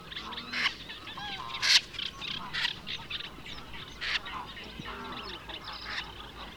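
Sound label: common snipe gallinago, flight call; karrend-wiesen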